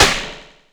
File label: DRM C#, YAMAHA R5+RX; Best Service ProSamples vol.55 - Retro Sampler; AKAI